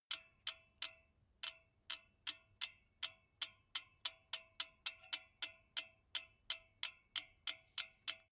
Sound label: bmx bike freewheel click